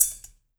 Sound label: bowl, metals; Spectrasonics; Liquid Grooves 1 & 2